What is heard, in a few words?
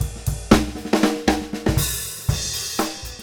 FILL; PlatinumPhattCD1; E-MU Producer Series Vol. 8 – Platinum Phatt; Grooves Filz Hitz